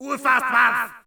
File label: men yelp